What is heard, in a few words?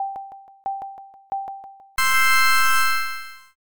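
countdown new year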